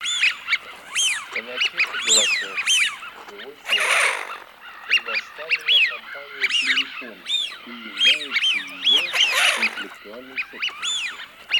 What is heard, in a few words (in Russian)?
Свиязь Fischione; plurifon